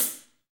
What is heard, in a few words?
HH, Kits, Rev